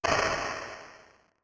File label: Throw Impact Explode